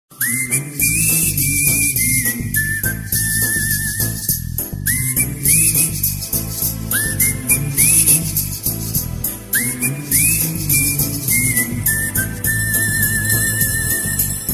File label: tamil bgm ringtone whistle ringtone bgm ringtone; minnale